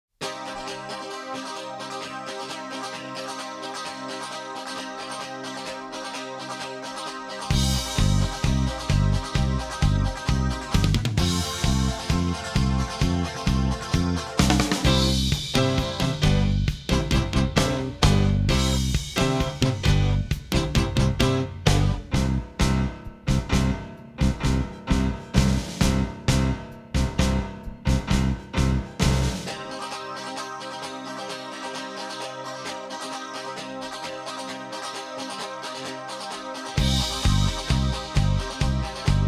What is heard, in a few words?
rhythm only; Neon Genesis Evangelion; Evangelion S2 Works